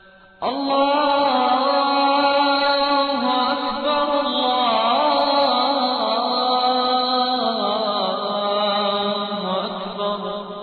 athan, ringtone; dubai mall

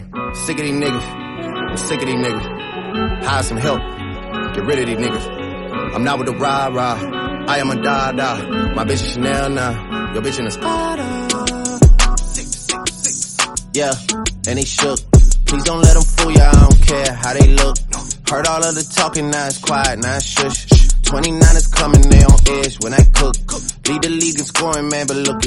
dance music tone ringtone, english

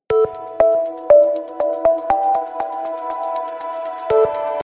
i900 omnia ringtone; iphone ringtones

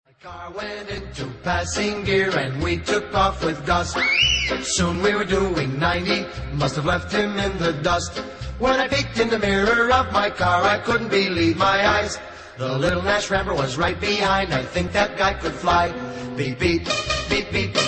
beep beep ringtone

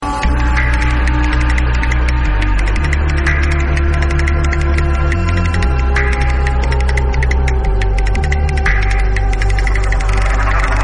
suspicious music tone ringtone

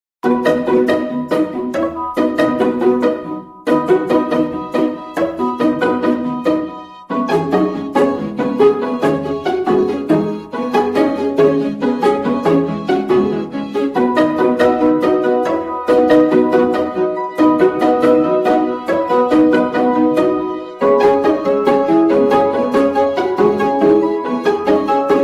nokia pasta ringtone

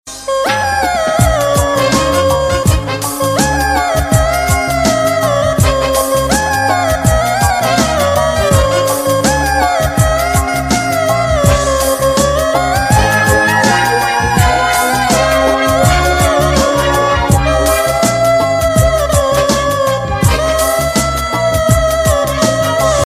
love ringtone; Love 2011; Valentine Day Ringtones

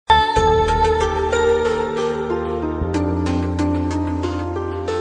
cool alert ringtone

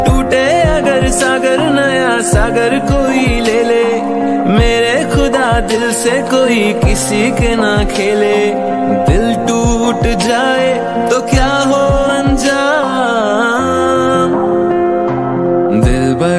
sad ringtone, bollywood ringtones

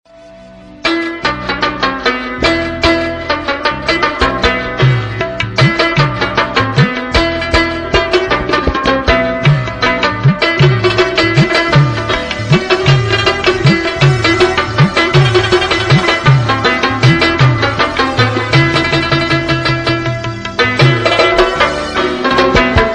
rabab ringtone

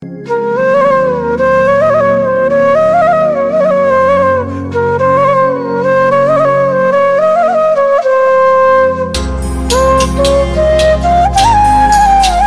sad flute ringtone

